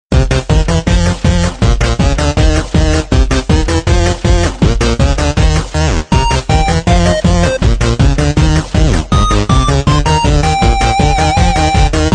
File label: 8bit